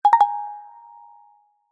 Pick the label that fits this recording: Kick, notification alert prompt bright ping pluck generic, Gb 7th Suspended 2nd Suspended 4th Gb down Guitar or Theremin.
notification alert prompt bright ping pluck generic